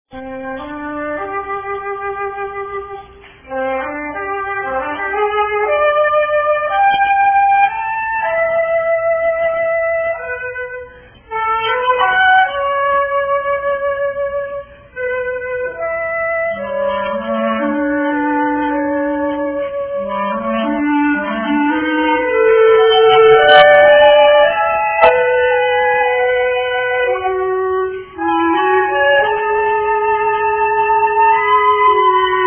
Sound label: trio; TRIOS À VENT; Divertissement pour clarinette, flûte et basson